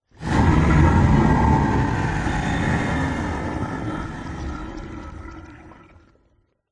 horror monster swamp huge menacing growl slimy bubbles